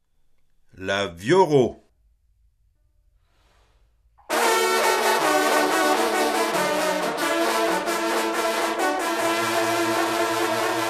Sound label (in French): fanfare; vioreau; bien alle nantes